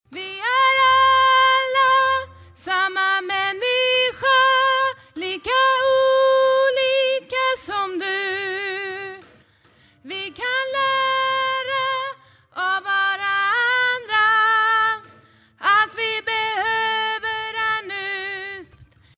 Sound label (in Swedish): bas